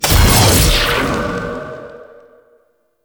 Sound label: Noctua Alt Shot Fire